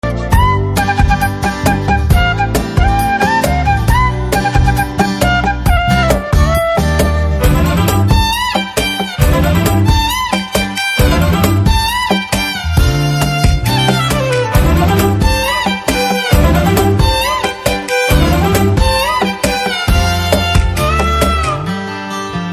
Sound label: BGM Telugu Ringtone; Kumari 21F